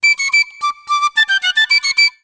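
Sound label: piccolo toot; Musical Instruments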